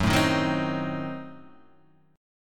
F sharp Diminished 7th F sharp Guitar Half Step Down